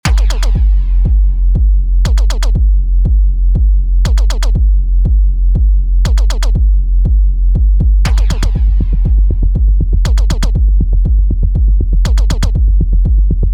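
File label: driving with the bass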